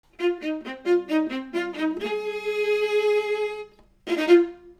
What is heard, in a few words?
violas